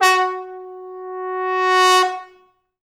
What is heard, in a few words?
F#3, Pop Brass; Best Service ProSamples vol.19; AKAI